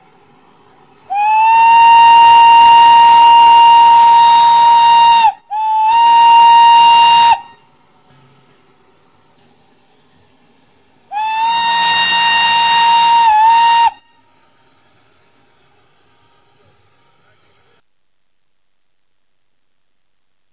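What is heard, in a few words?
whistle two, train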